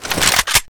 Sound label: smg draw; weapons; deluge